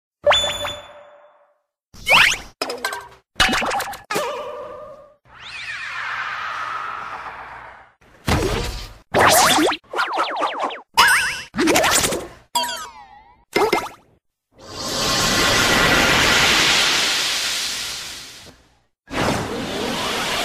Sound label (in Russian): anime zvuki